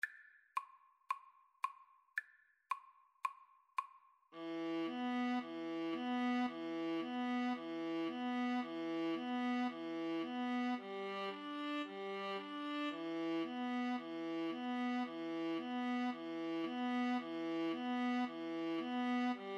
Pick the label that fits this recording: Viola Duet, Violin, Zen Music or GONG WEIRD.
Viola Duet